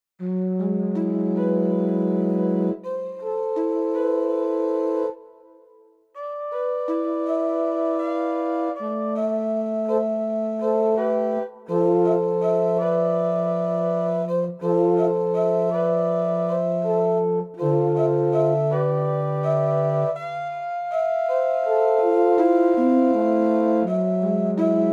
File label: sea trumpets